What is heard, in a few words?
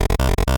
8bit se